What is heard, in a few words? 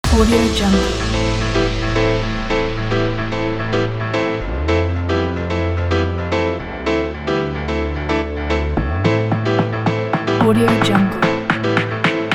house groove